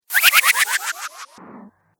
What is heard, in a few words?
Magical Air